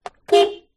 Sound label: car horn beep internal toyota corolla; transport